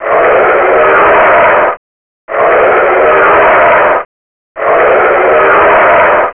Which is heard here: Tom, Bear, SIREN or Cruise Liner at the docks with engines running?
Bear